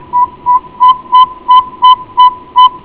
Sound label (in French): petite nyctale